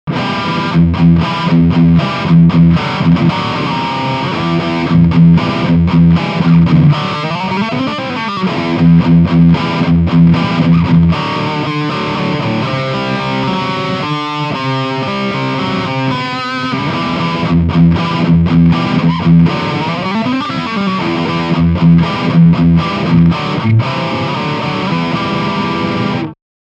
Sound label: High Gain Riff Scoop